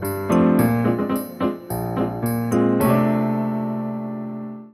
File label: Ballroom Cuties, Sting 2